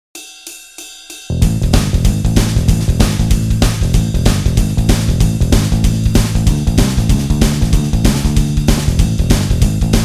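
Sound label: Metal Boogie backing track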